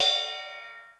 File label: Ride, Korg DSS-1, Drum Machines